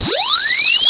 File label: siren